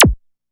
KORG 01W KIT; Rubber Chicken Software Sample Bank

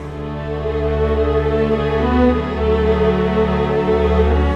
Cinematic Strings, Orchestral

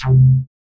WET BASS; Masterbits; Dance Trax

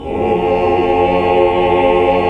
MIN, CHORDS; Sound & Vision - Gigapack II CD 1 (Roland); HAL Greg.Chords1

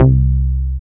BAS, Eurotech; Sounds Good

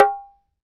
Dholak; Roland BK-5; Percussion

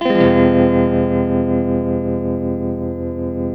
TELTMUMIN F#, TELE CHORDS, Guitars; Spectrasonics; Hans Zimmer Guitars VOL-1

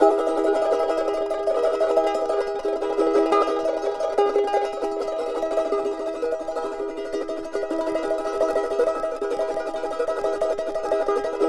CHAR F MJ TR, charango; Spectrasonics; Hans Zimmer Guitars VOL-2; CHARANGO CHD

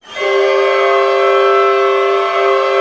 Clusters; ORC CLUST; Chord Cluster; Roland L-CD702

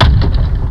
Kick, Fairlight CMI III

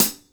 HH CL, Drum 1 Dry; East Collexion

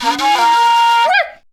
FLU + VOC, FLUTE + VOCAL

